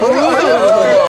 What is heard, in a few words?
AUDIENCE EXCLAIMING SARCASTICALLY